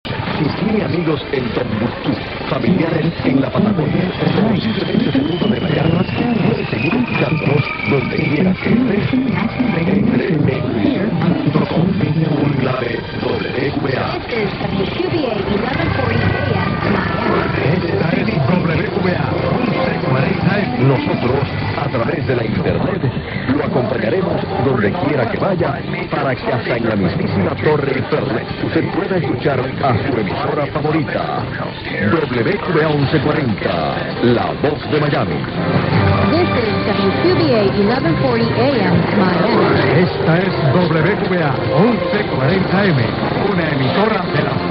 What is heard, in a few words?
1140, mw dxing; wqba